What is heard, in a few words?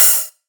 juicy open high hat a sharp key